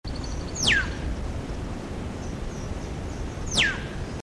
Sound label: lafresnayes vanga; Madagascar; Madagascar - 20th Oktober to 16th November 2003